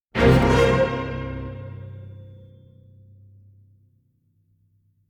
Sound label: Orchestral Hit